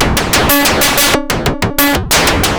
FM Perc Loop, Analog Glitch

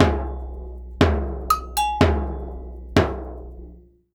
120 AGOG, Percussion Loops, AGOGOS

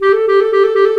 THE CLARINET

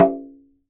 Pelegongan, Percussion; Roland BK-5

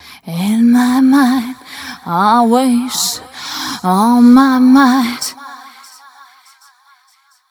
f mel c, fem Vocals

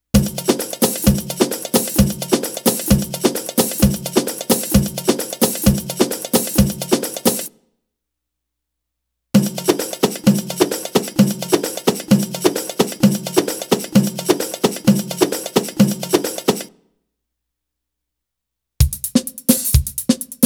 Arabic Rhythms

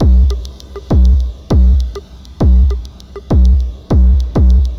drloop, drumloops, Vinyl Attack; Masterbits

